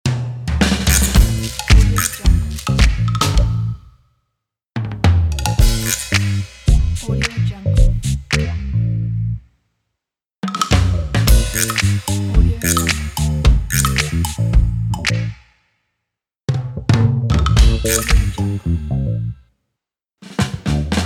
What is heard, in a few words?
sitcom love